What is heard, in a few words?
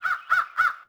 Crow Bird; ambience; Project Gotham Racing 2